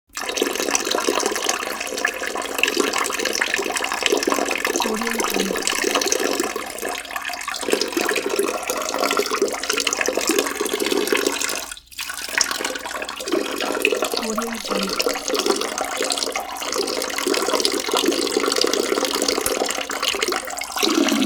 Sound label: peeing in a toilet